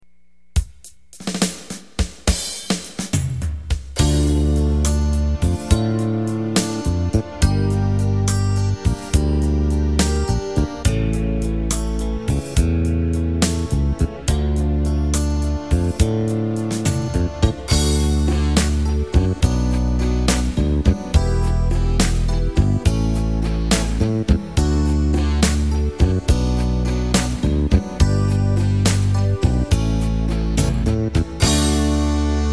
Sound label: slowrock; yamaha